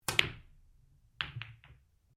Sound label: Billiards Cue Ball Ball Cushion, Home billiards table; Pool; Sports